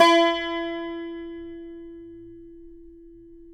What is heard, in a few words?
cumbus; Roland - LA Composer vol. 3